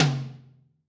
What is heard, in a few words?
RoomP M, Tom, Yamaha DTXpress-II